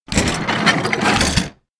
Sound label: truckbed engine carry; anemoi